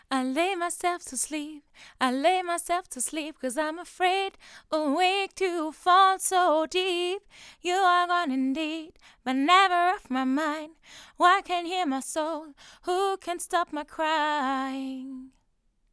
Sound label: vocals jm440